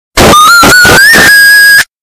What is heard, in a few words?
whistle bass boosted; mcdonalds